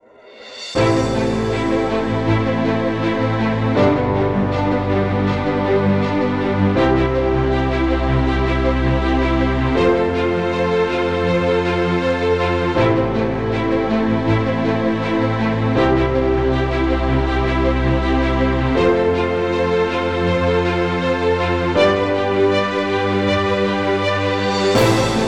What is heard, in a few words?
Triumphant Corporate